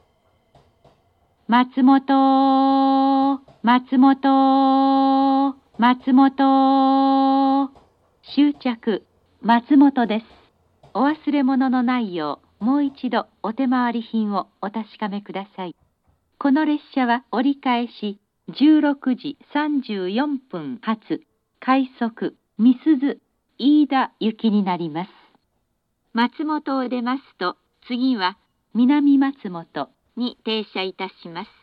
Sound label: matsumoto turn rapid, station; misuzu; iida; jr-east